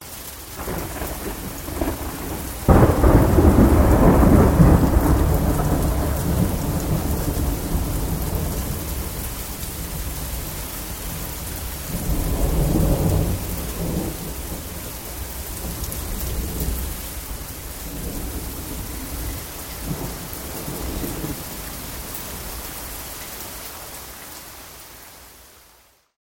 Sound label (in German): gewitter